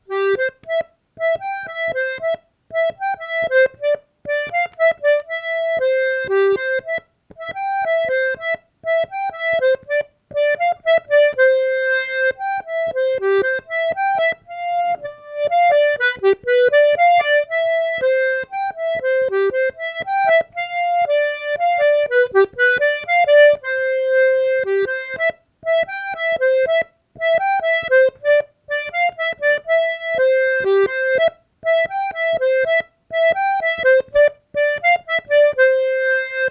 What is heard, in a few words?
mazurka